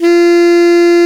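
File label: Soft Soprano A2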